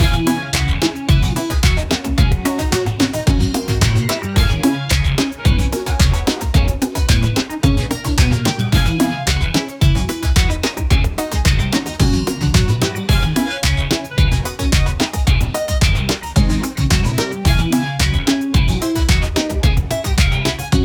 bright funky beat with; upbeat

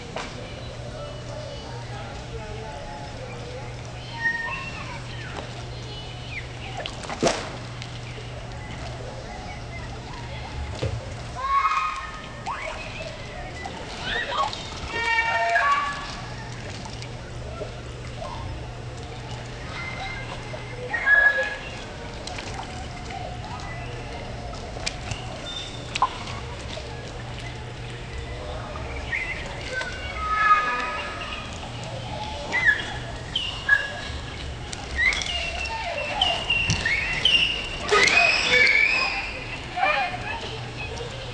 indoor public pool, diving

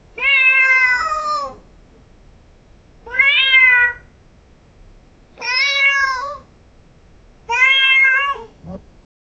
a cat meows and it

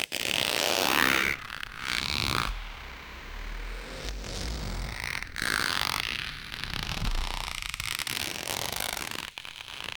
stretching latex or rubber

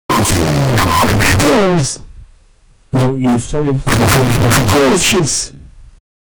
fear hopelessness, aggression

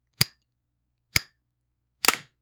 a quick snap of each, briefcase

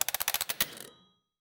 casino slot machine reel

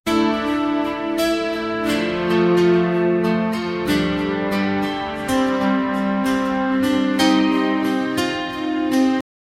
warm music in a palace

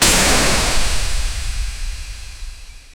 an airship breaks through

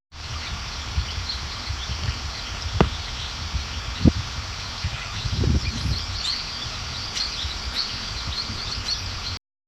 sound of a farmer harvest, farming

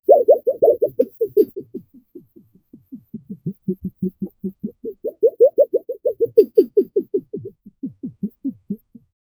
boing bouncy ball

